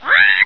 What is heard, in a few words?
bird